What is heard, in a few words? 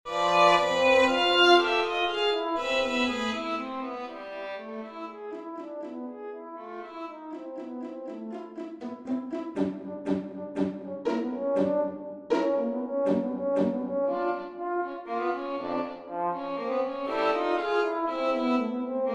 Birthday Duo for Violin Horn